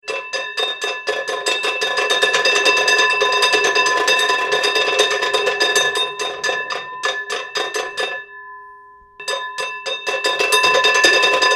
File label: Alarm Bell Hand Cranked, Alarm Bell - Hand Cranked large fire bell; Tom Hutchings